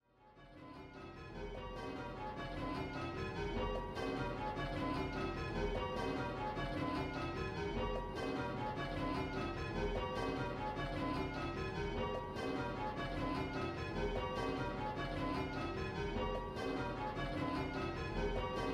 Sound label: Call Change 10-Bell, Bells